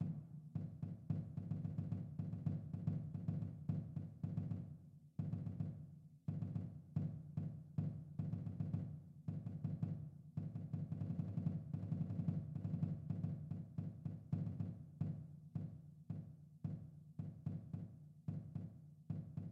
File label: bass drum audition